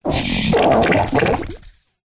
cartoon slime fart airy bubble; zapsplat

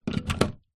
household night light plug in socket

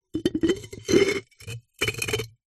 household pot ceramic base lid scrape

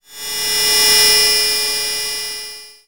sound design metalic harsh tone

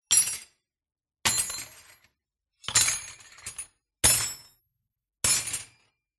wrench tools being dropped onto a metalic surface; Sound Ex Machina

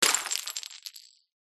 beer bottle full drop concrete ground smash

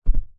cat jump onto mattress; zapsplat

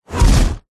impacts fast whoosh into thud designed

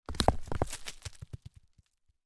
rock small down on others leaves roll ground; foley

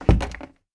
household door wooden internal shut; sound effects one

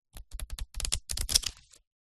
household book very small pages flick through

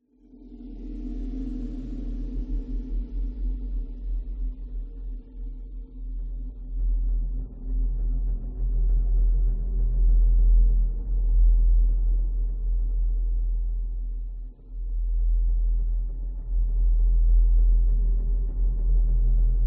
science fiction drone deep dark lfe ominous; zapsplat

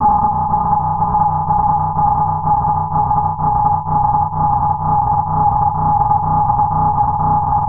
Broken Club Synth; martyn stonehouse

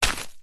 footstep single shoe wood chippings pile; foley; zapsplat

